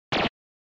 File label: SNES Shooter Shoot